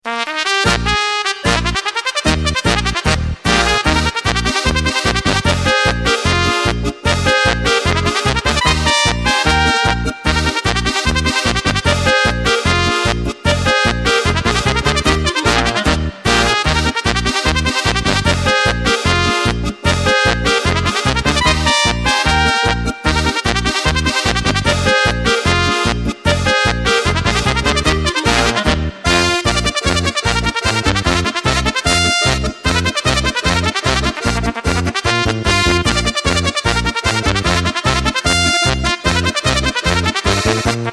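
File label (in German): Flügelhorn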